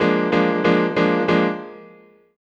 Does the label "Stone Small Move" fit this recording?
No